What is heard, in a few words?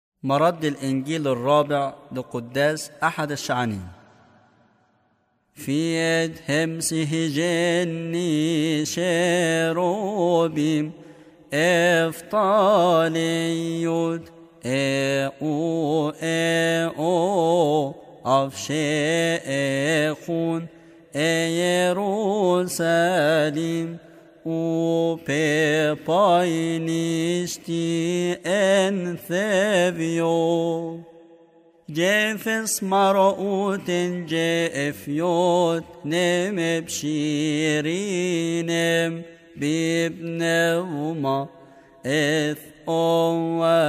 Gospel response; Fourth Palm Sunday